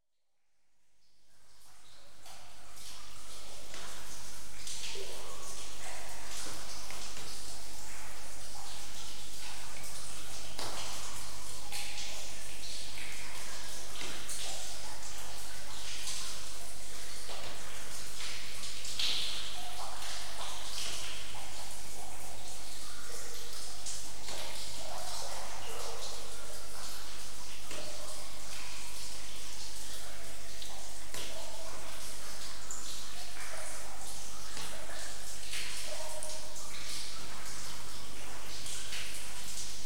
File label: Cave, Rivers Streams Water; BBC Sound Effects Library